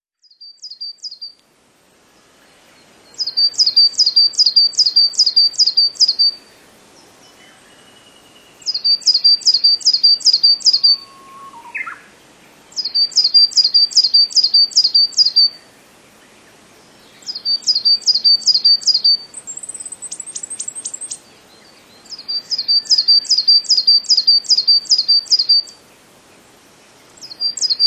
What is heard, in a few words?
coal tit